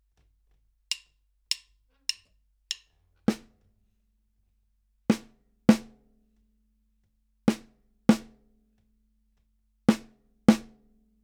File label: Ode to Joy Percussion play along